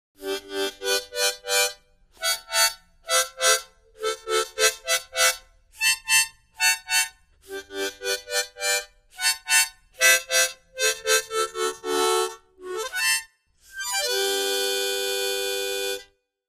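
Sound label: Mouth Organ Waltz Melody, harmonica; woodwinds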